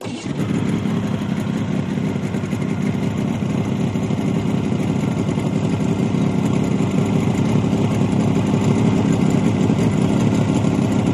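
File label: 150 Hp Mercury Start Loop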